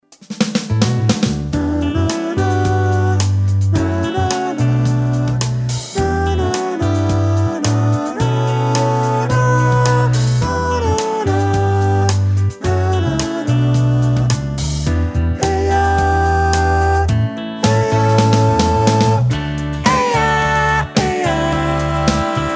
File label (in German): Sopran